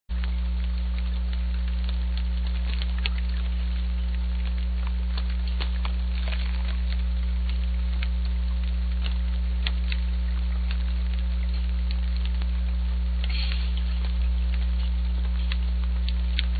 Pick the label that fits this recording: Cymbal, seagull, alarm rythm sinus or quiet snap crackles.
quiet snap crackles